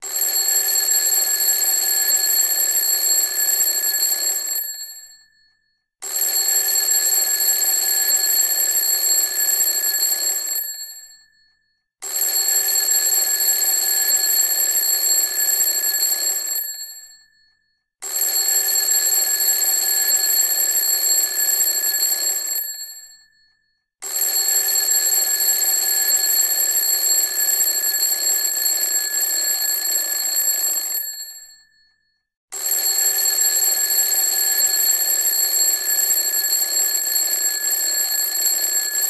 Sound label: Schedule Vintage Clock; LG Wing 5G Verizon; ringtones